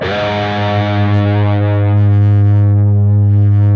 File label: PRS A, Guitars, LEAD; Spectrasonics; Hans Zimmer Guitars VOL-1